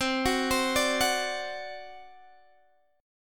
C Suspended 2nd Flat 5th C Guitar Standard; arpeggiated; 20,21,22,19,19,x; JGuitar

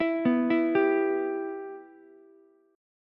B Suspended 4th Sharp 5th B Ukulele; Bsus4#5 arpeggiated; JGuitar

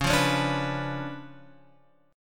C sharp 7th Flat 9th, Guitar, Open F; C#7b9; strummed; guitar chord

